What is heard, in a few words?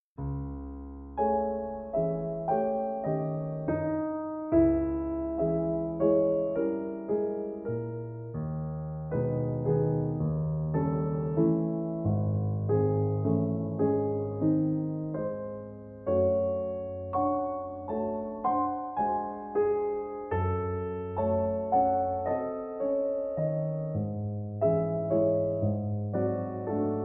Prelude In C Minor Op11 No10